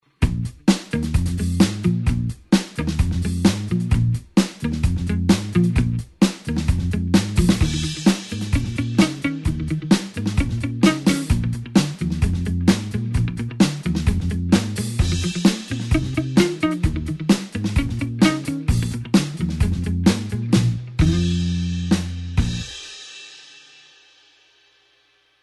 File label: blues 8beat backing note